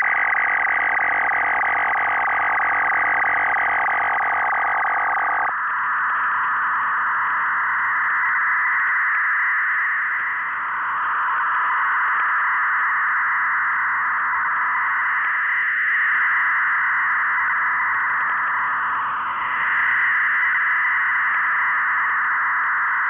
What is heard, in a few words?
4fsk 200bd and bpsk 1000bd